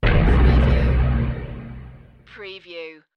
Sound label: Force Field Impact